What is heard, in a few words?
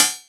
Cymbal